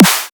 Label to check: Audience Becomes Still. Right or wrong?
Wrong